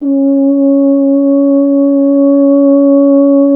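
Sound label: BRS F.HRN, Solo Instruments, French Horn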